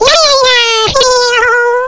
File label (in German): Level end Freude; Jump Zampoli